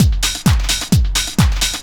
130 tribal, LOOP PERC TRIBAL; Techno Trance; DRUMLOOPS; Ueberschall - Techno Trance Essentials

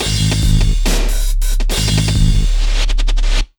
Big Beat Drumloops, 140; USB Soundscan vol.25; AKAI; TECH 140

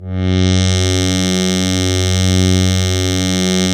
SYN SPACE; MicroWave; Sound & Vision - Gigapack I CD 2

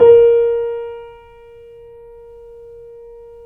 KEY, YC7 Piano pp

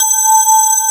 METAL BAZZ

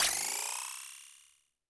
percussion, Roland TD-20

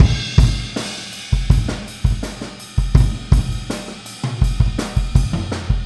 Boogaloo Fill Kick, 82 BPM, Drums

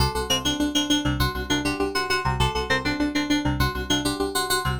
synth 100 bright C D F G A; Transmission-X

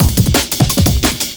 175, Breaks; Drum&Bass; EZ Rollers

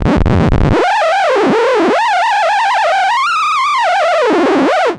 EFX, SFX KIT; Wizoo - Platinum 24 Electronic

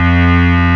BS FUZZY, Wave Bass

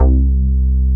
BAS, Eurotech; Sounds Good

